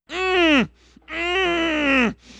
grunts

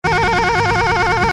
clean Ring; hotel phone ring; ringtone; fight night

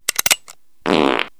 fart

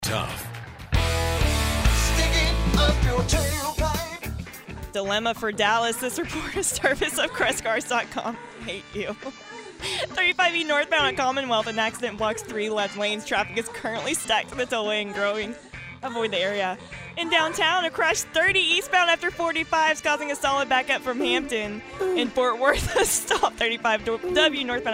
Traffic; traffic bed; ticker beds

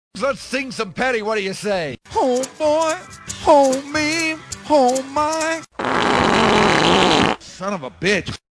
farts

